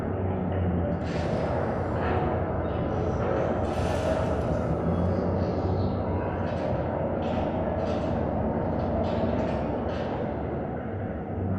Robot Arm Track Slow Dist; Boomtown; Titanfall 2

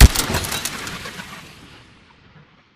atk mech warble tail; Titanfall 2

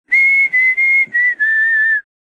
conductor whistle; Commander baker; Titanfall 2

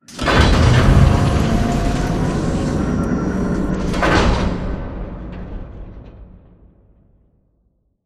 Robot Arm Turn 180 Close; Boomtown; Titanfall 2

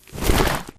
Grunt Mvmt FOL Soft Med; Titanfall 2

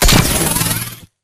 Nuke Crit Impact; AI Stalker; Titanfall 2

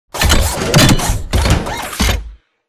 dash right; Titanfall 2

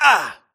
grunt hits; Titanfall 2

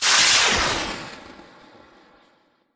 ignite; Titanfall 2

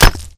bullet light ballistic concrete close; Titanfall 2

